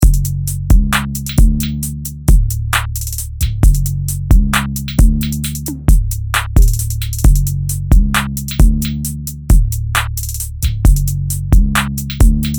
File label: Tape; Ape Tape Bundle